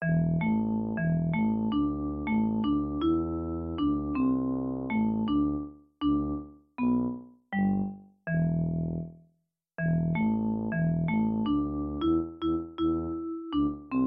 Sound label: MIDI